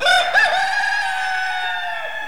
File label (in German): Hahn